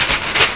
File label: paper wrinkle short